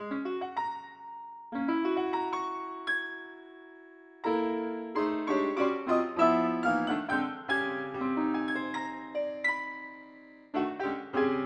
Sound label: piano